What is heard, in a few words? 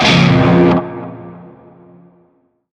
Shawzin Hirajoshi Chord; Chord E; Music; Warframe; Narmer